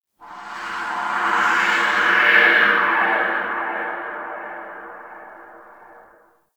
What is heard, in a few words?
Wind Pipe One Off; Ambience; Dante Unbound; Warframe; Entrati; Dead End Secret